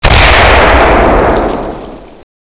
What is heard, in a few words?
kaboom